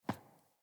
Boot Walk Metal, Footsteps; Enemy; Sinecure; Melee Grunt; Boots

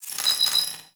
Side Pillar Rand, Small Cargo Room

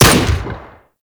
Thumper Bot Shotgun Fire; Warframe; Grineer

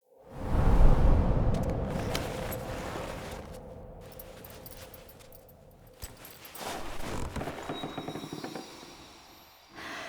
Pickup Flute

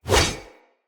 Whoosh, Swords; Weapons; Mockery; Mockery Brazard

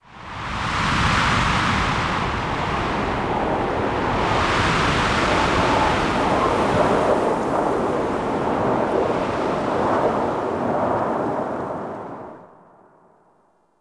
Exterior Wind Gust; Duviri

